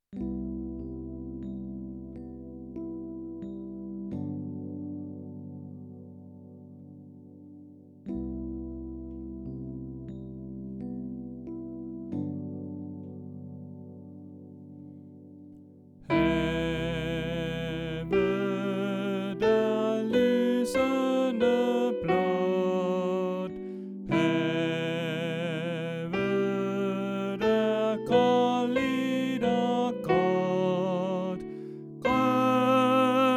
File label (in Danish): Sopran